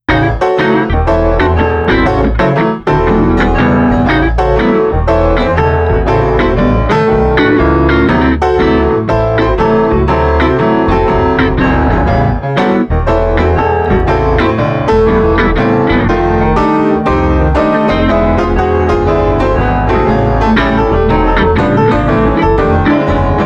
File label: Street Piano Trio Blues Rag 120